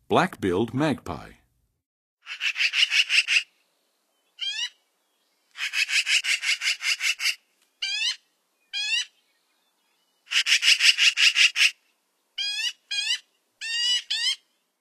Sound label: Black-billed Magpie, Songs of Birds; North America; Western USA; Western USA Songbirds